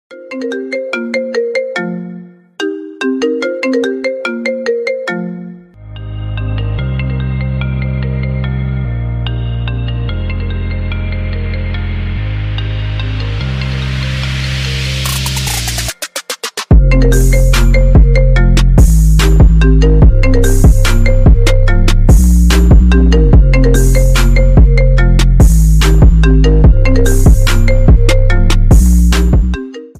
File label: iphone ringtone